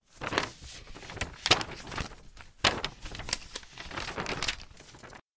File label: Paper Mix